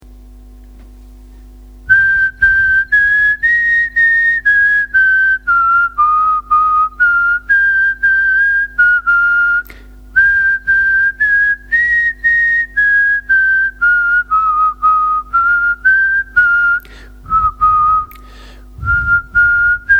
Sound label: whistling